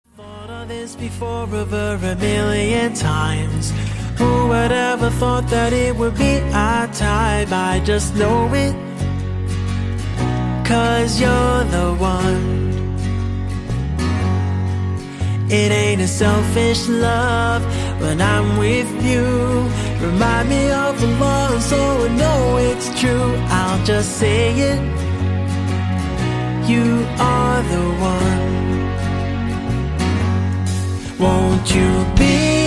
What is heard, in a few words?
bg music